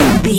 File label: retro impact hit